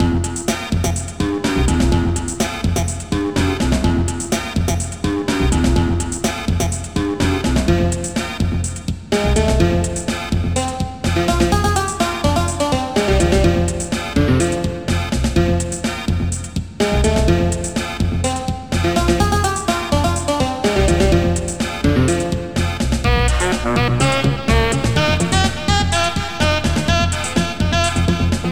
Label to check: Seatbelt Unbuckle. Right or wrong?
Wrong